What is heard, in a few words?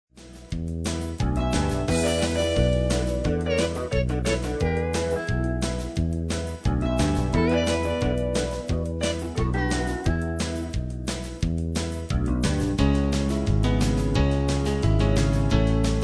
country